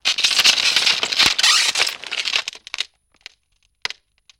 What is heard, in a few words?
Ice Crack; Ice Crack Trimmed